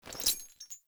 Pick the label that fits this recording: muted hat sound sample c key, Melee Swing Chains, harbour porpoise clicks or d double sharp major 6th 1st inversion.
Melee Swing Chains